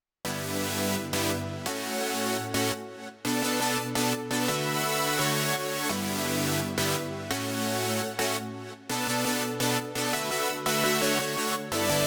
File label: Synth with Neutron